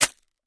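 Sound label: Plastic Crush Short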